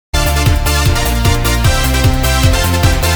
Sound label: Loop